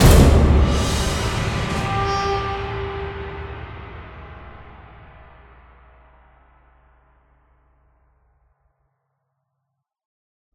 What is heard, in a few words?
combat start; biomutant